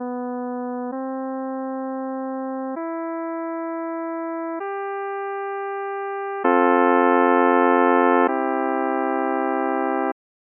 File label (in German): h c e g a g in c dur